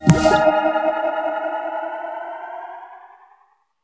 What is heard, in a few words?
Level Transition Out